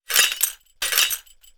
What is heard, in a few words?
sweeping glass shards; windshield